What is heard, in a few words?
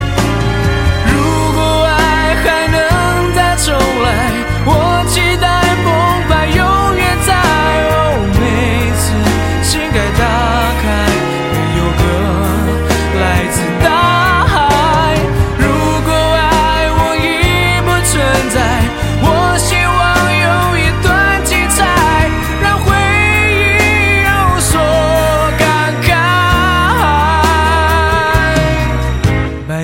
piano ling sheng